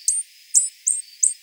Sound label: vervain